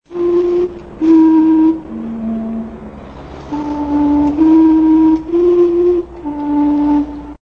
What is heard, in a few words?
steam clock; originalfilmton